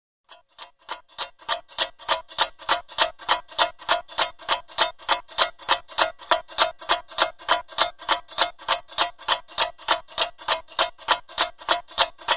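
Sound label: sound of clock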